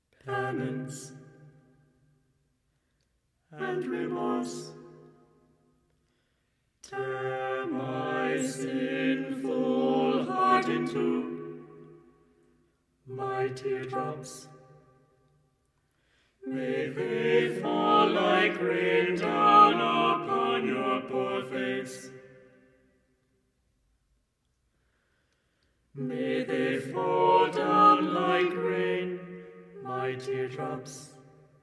Version For 4 Voices & Percussion; No. 5, Penance and Remorse

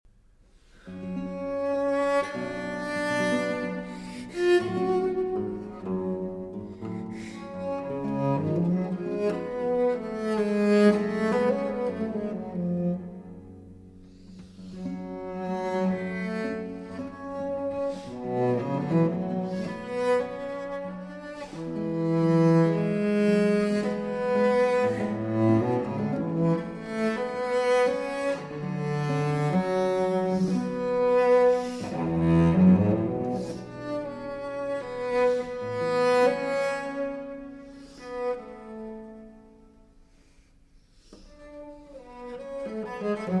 Cello Concerto in G Minor, Adagio

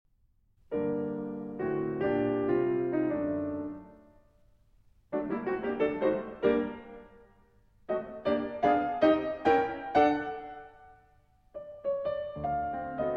Sonata in G Minor, Allegro

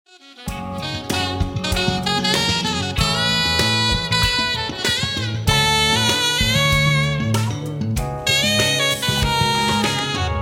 Funky

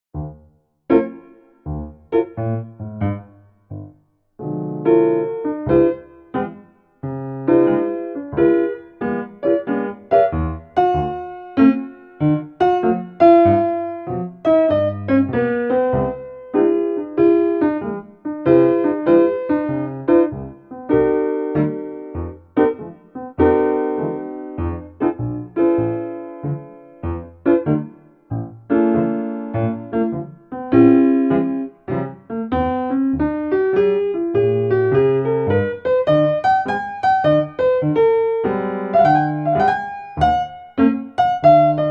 Instrumental Chill Jazz

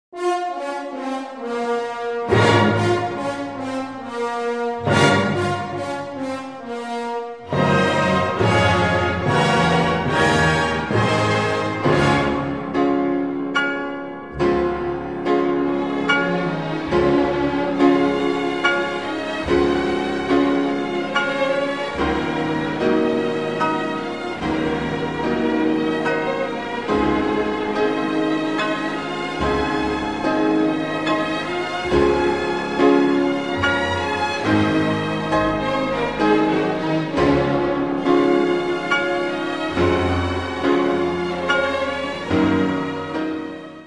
Piano Concerto No. 1 in B-Flat Minor; Tchaikovsky

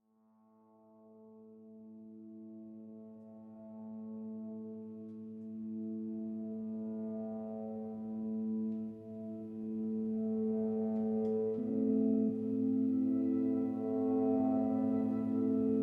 Organ Piece

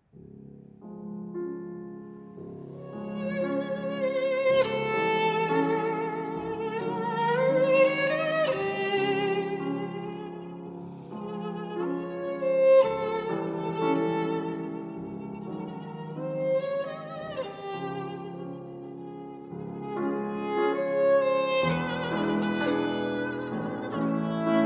Love Song, Violin and Piano; 6 Pieces, Op. 7; Arr. Kocián

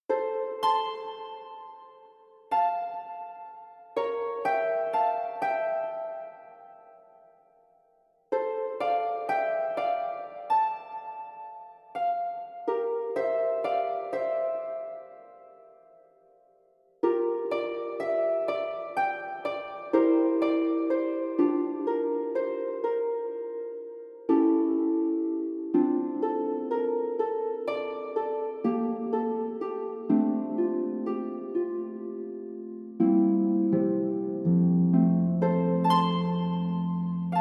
Harp

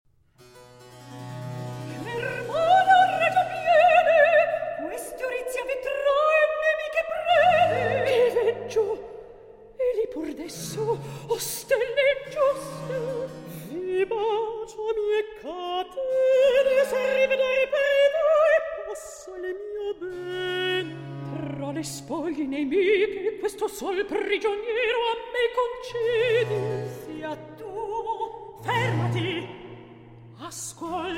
Recitativo; Orizia, Ippolita, Teseo, Antiope

